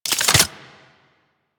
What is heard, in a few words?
Crossbow Reload Pullback, weapons; Garuda